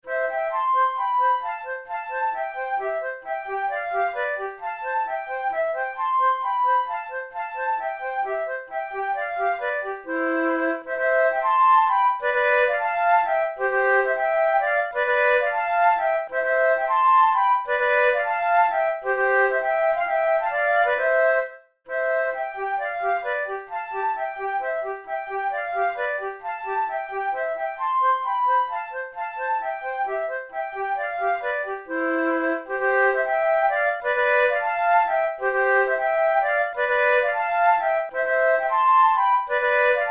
waltz duet, flute

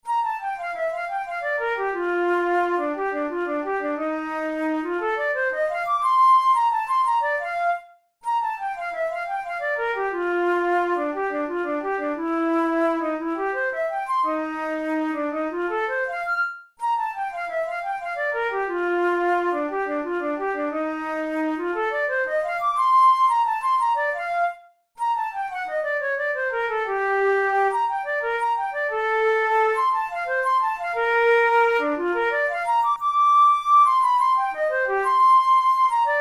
study in b flat major, flute; kohler op33 no1 study no8

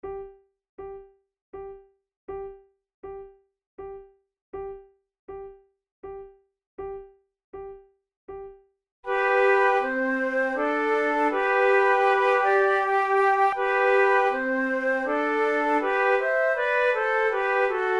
trio

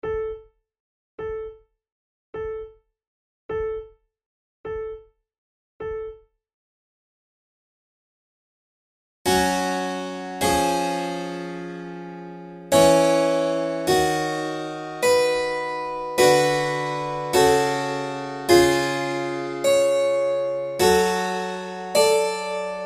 corelli violin sonata op5 no9 iii adagio, flute

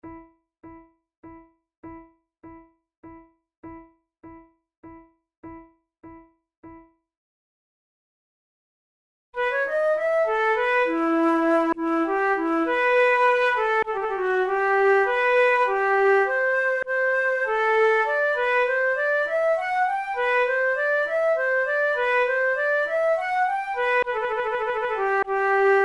concerts for two flutes courante; monteclair; a litalienne

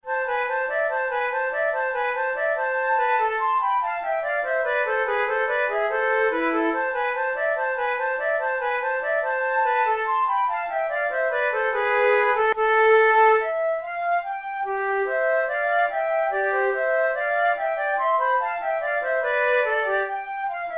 gavotte en rondeau, flute

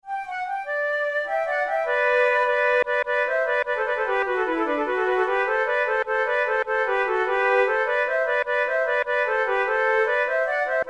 gigue, flute

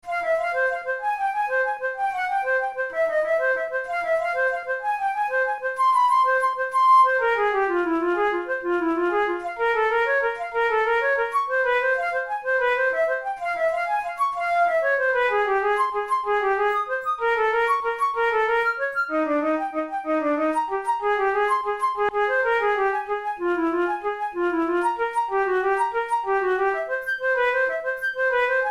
methode pour la flute study; drouet

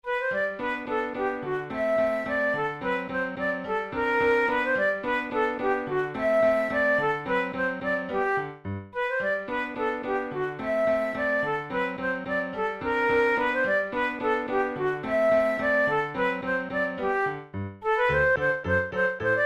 ecossaise in g major, flute

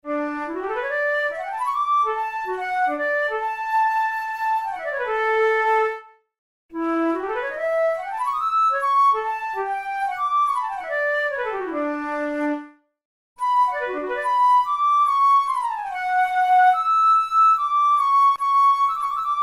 studies for the boehm flute; drouet 72

